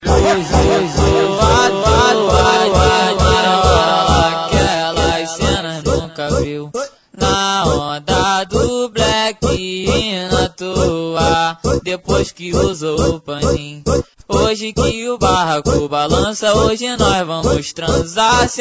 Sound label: Funk